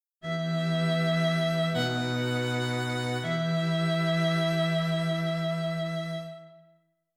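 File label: string quartet, dictation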